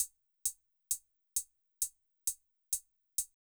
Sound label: Beat, Old Skool House, 132bpm; Big Fish Audio; Marshmellow; Construction Kits